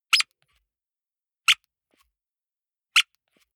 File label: squeaker squeeze hard